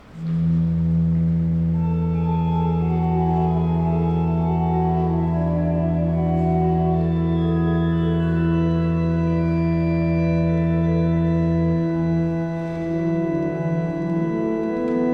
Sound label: Organ interlude; Colloquium; Vespers